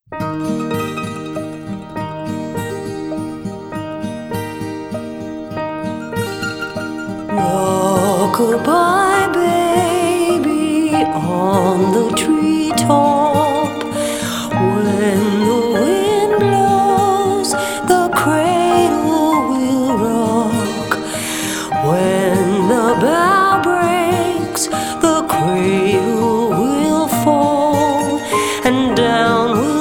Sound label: Rock A Bye Baby Vocal